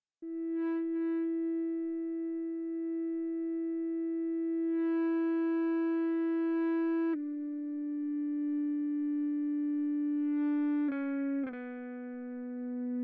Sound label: Ebow Chorus